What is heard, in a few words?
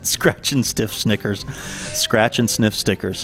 scratch stiff snickers